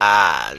"ah"

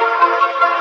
keys boop bam

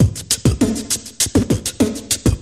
-, Beatbox, Drum loops

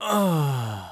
"uh" relief; ooh ahh; uh um

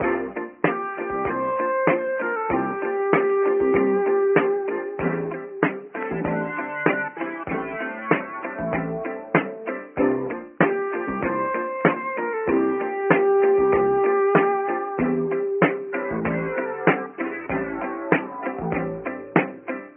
full, Loops Phonky

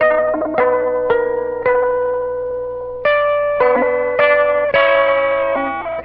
warbled warmth, Loops Electronic

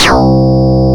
hard, Synths

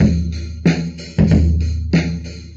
chuk, Drum loops Boombap

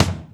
Kicks Loud

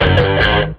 funky boss

